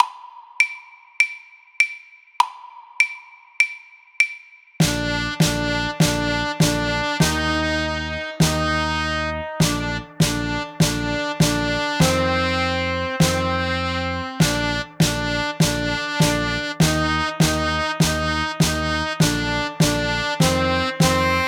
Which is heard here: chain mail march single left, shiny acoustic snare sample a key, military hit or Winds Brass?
Winds Brass